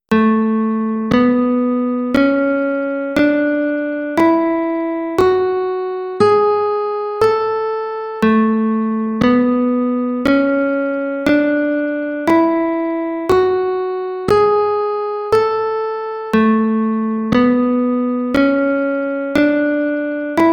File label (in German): A Dur Tonleitern fuer Gitarre